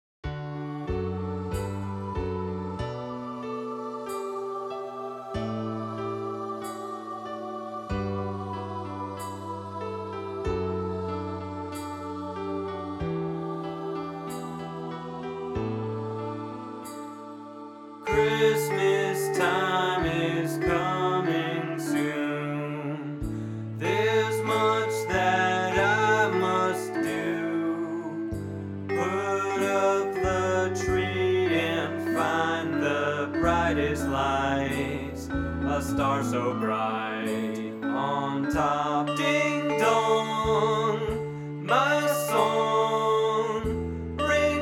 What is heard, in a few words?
vocals